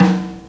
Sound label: JAZZ TOM, JAZZ DRUMSET